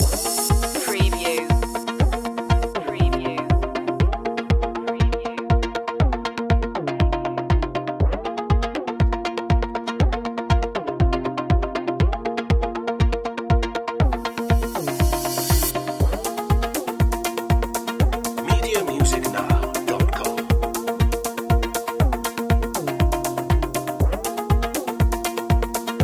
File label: electronic; media music now